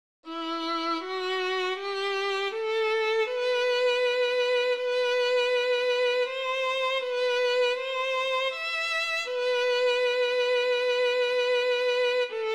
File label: Crying violin